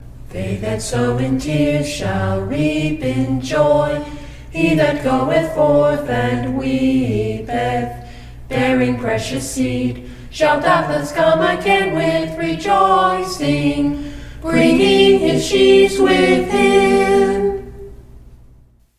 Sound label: a capella scripture song; psalm